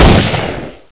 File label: dragon gun shoot